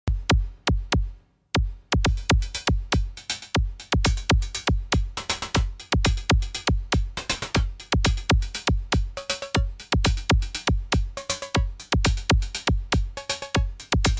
TR6S FM Beat

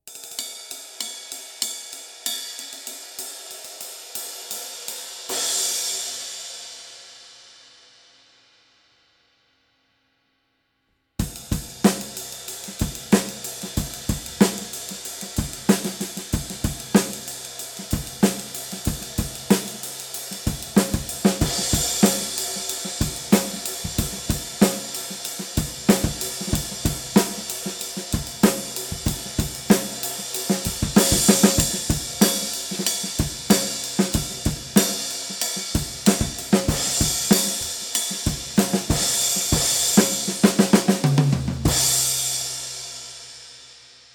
Big Apple Ride

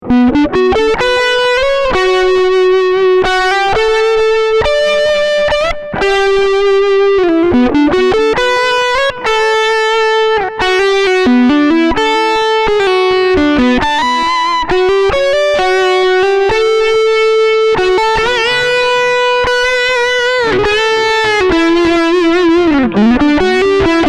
LEAD

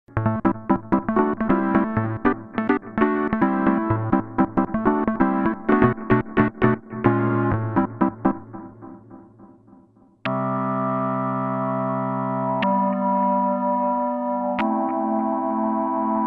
Bendy Organ

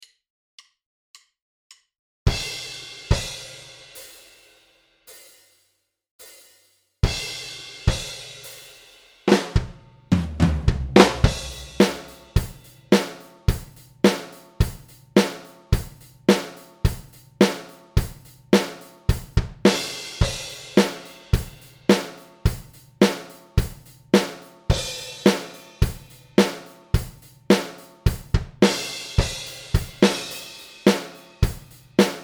Rock2

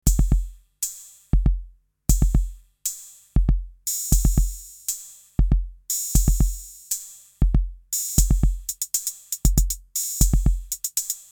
TT 606 Beat; Nonsix

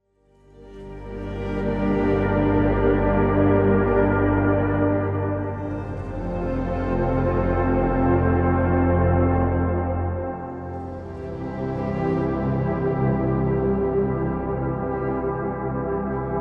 Sound Design Pad Colors; Audio Imperia; Areia

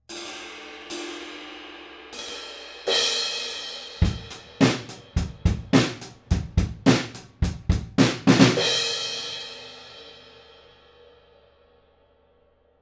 Schoeps CMC6MK2S Drums Front Of Kit

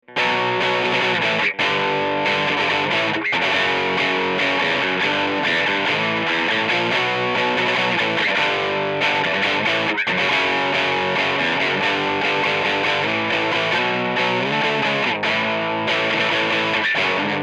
Neumann TLM 49 Guit Amp

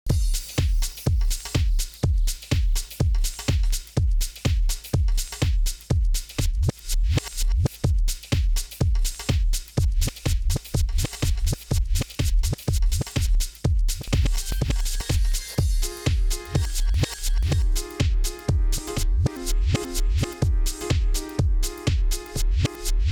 Novation Twitch Reverser

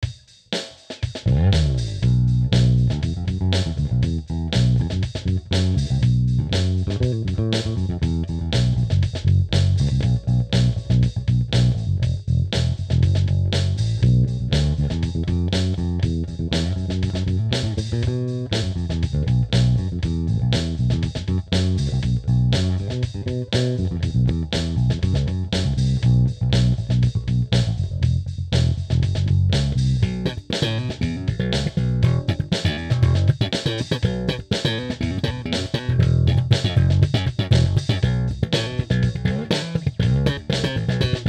P Bass Aux in Loop

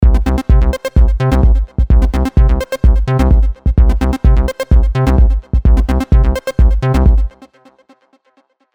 Minimal; Nexus 3

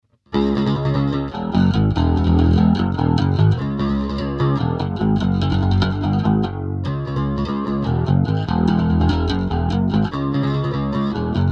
Ampeg Liquifier Pick

